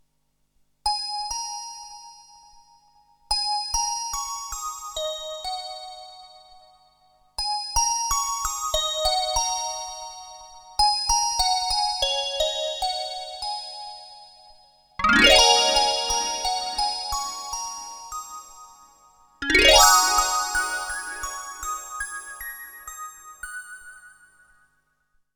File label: jx8p music box